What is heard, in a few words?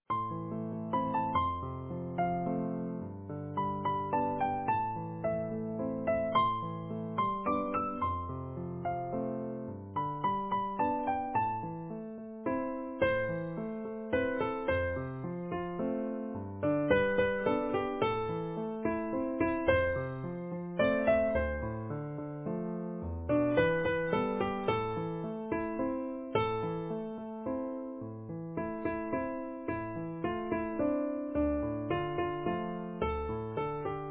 Easy Piano